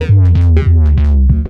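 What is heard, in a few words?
synth bass, drum and bass, 160 bpm, C; eJay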